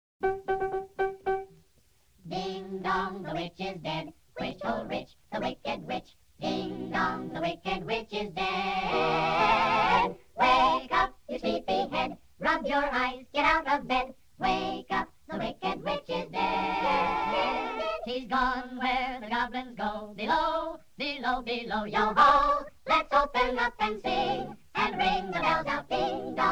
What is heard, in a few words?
(Alternate A Capella Choir Version); wizard of oz